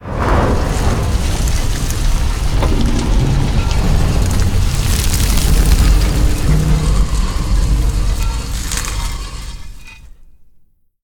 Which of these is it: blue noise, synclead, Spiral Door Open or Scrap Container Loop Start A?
Spiral Door Open